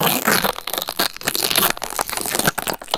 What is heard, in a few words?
styrofoam packaging punctured and broken